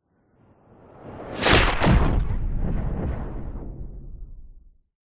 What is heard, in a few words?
science fiction explosion; zapsplat